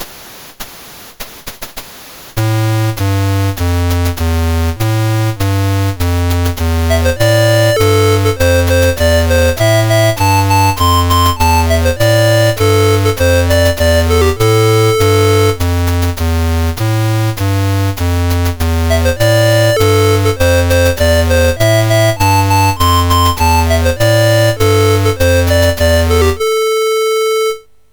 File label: MOS6581R4